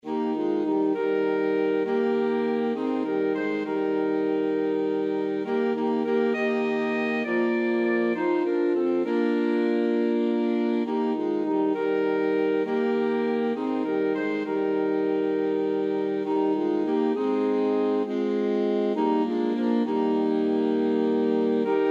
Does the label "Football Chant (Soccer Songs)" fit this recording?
No